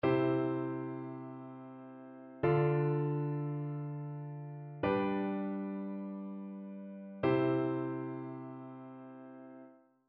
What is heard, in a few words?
harmonic dictation; aural skills i